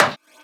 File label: Percussions